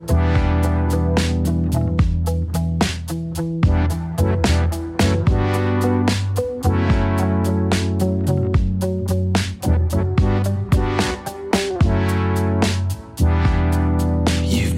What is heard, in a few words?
Slow Wave Breathe